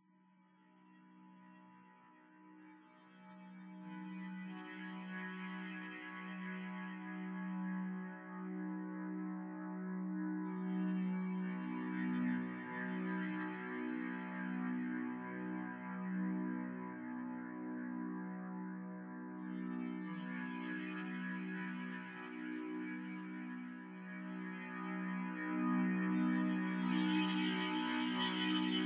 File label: Guitar